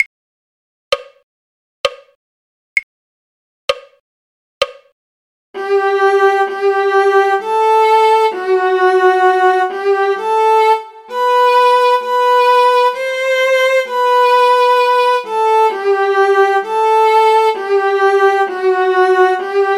viola; folk

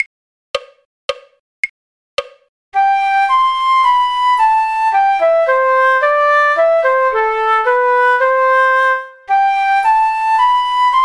flute; sweet polly oliver; folk

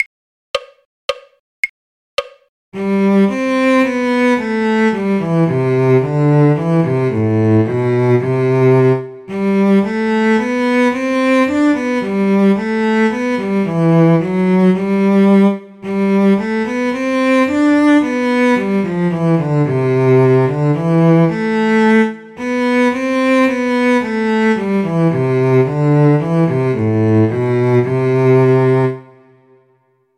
cello; sweet polly oliver; folk

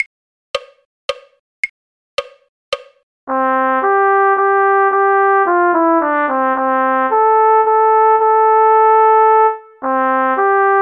cornet; lavenders blue; folk

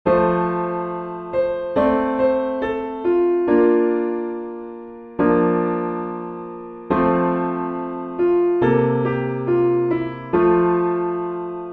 piano backing, hymns; recorder piano hymns

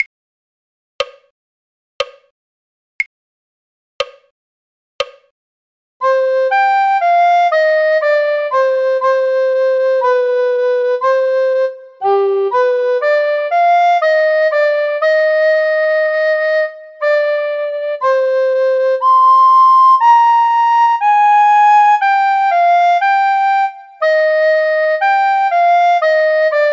recorder; David of the White Rock; folk

